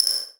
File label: multimedia rollover; sound effects one